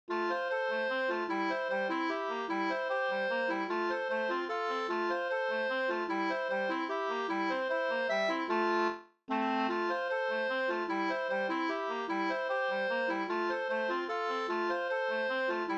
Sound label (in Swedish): folkdans, Valsvariant fran Bayern